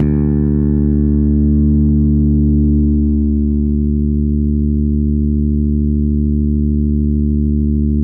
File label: bass